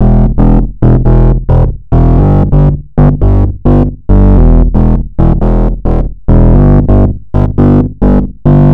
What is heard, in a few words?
Phat Mode Distort; Camel Crusher